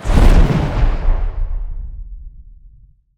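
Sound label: explosion norm; weapons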